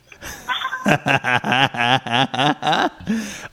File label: awesome laugh